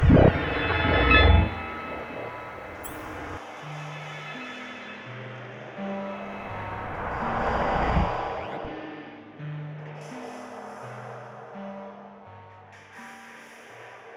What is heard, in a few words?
splash snake